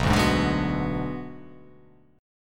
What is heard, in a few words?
B Minor 6th B